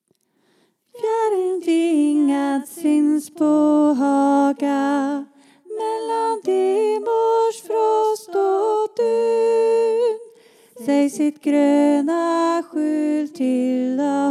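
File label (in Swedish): Bar, seniorkor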